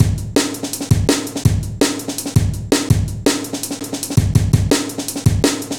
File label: Heavy Live A, Drum Loops, Jungle